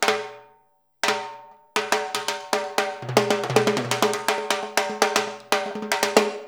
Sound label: FILL, Percussion